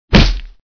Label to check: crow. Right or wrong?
Wrong